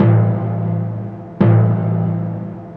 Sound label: Battle Drumloop slow; treasure planet battle at procyon